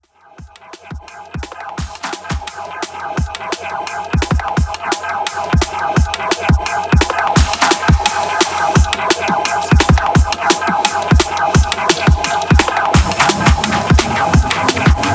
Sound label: Bass Drop